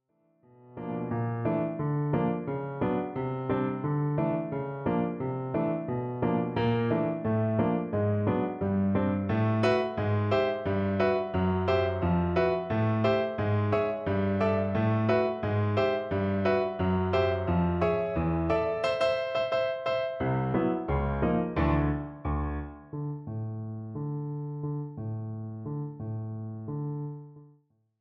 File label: cakewalk